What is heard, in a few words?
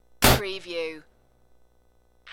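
Small Metal Door Slams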